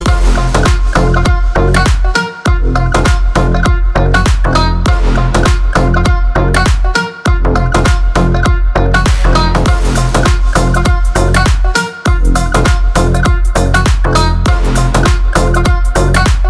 punjabi

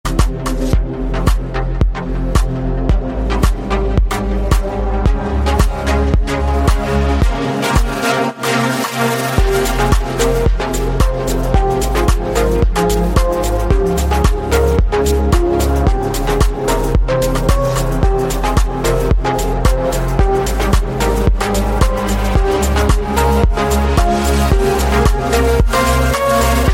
slowed version

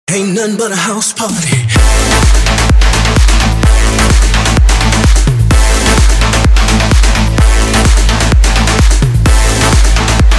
funk machine house party

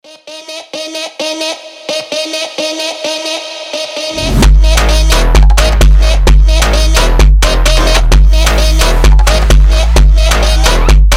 brazilian phonk

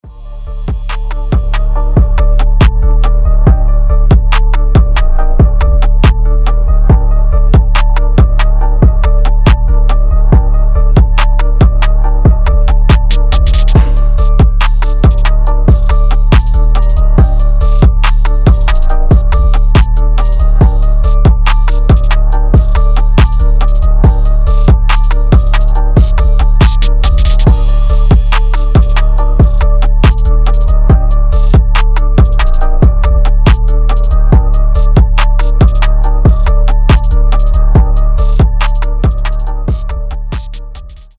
coin beat

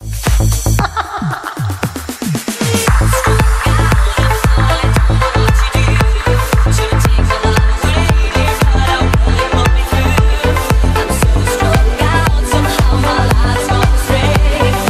deep house remix

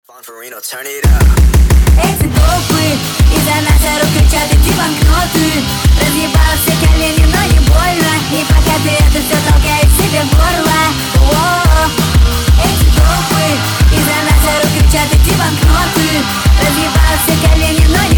speed up

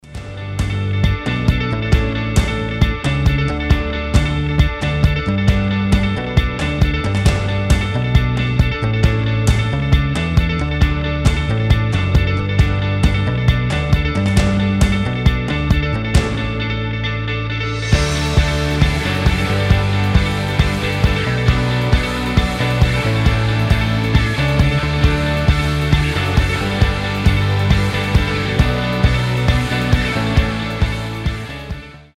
instrumental

